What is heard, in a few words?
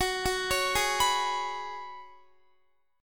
F sharp Suspended 2nd Suspended 4th, Guitar; arpeggiated; x,21,18,18,20,19